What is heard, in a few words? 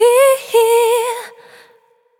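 B flat minor, Vocal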